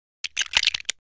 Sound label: smash; creatures organic